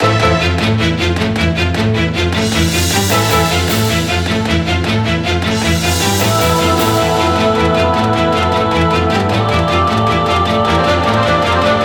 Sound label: BGM Fantasy; Dark Elfs Adventure